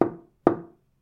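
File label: knock on wooden pillar; knocking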